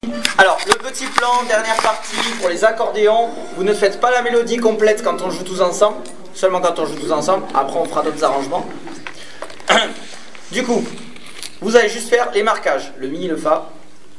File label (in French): marquage diatos